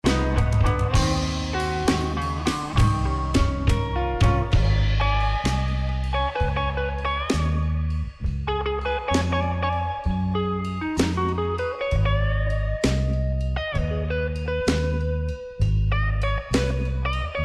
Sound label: Blues